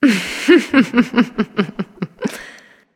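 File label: heh heh, female; smite; voicepack; hera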